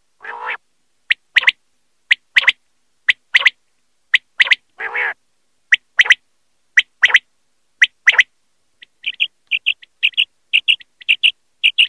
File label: common quail sound